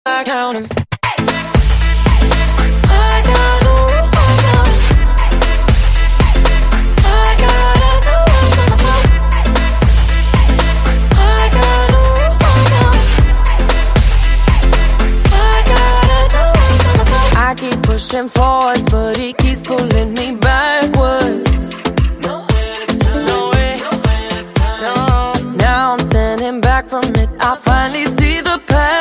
english song tone ringtone; english ringtones

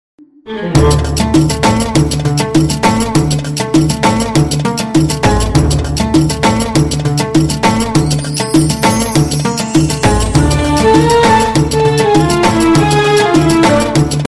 arabic instrumental ringtone, islamic ringtones

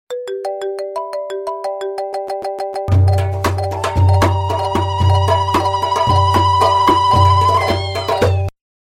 marimba arabic iphone apple ringtone

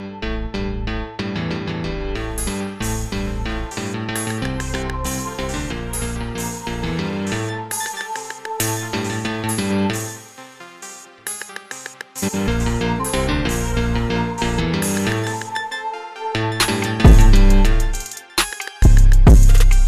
nokia ringtone; nokia p1

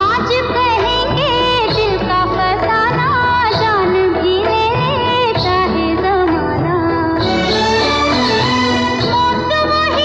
old hindi song ringtone

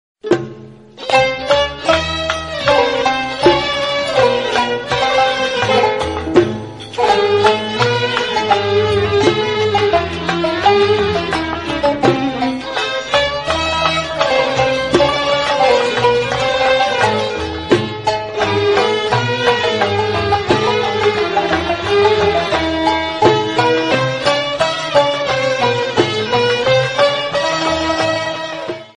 country music ringtone; verizon

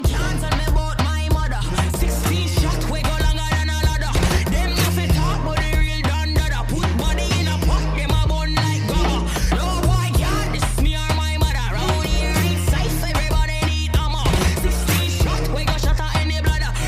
bgm music ringtone; english ringtones

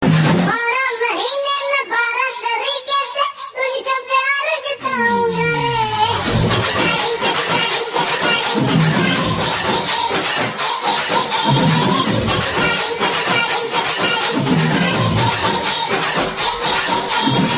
child ringtone, funny ringtones

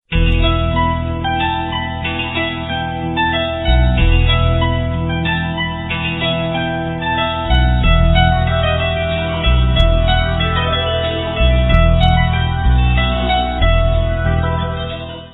melody ringtone

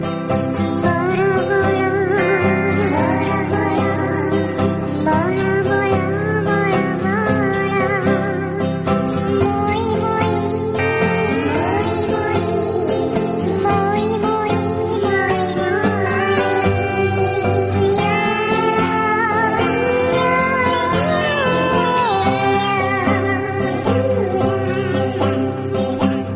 funny kid singing call notification tone

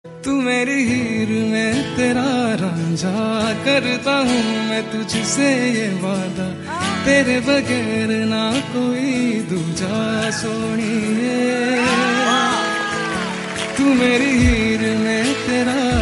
lovely tone ringtone, bollywood ringtones

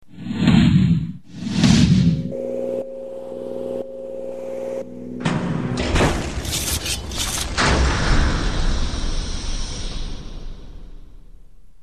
open gate sms ringtone

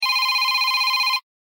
ring digital ringtone, nokia

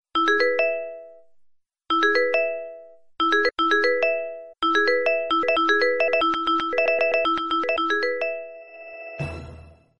short music message tone ringtone, sms ringtones

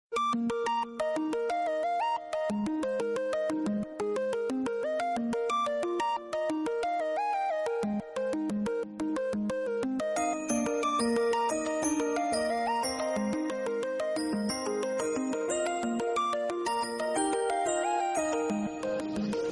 simple lovely call notification tone ringtone

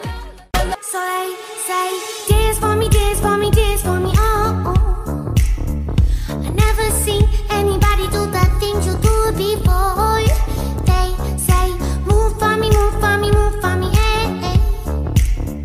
english ringtone; english ringtones

